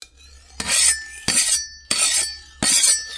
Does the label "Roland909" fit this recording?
No